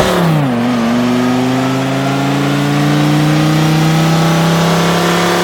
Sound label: third, vehicles cars; lwcars; gmc savana